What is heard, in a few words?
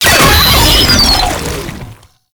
Proto Infested Brachiolyst Death, Vocals, Enemies; Warframe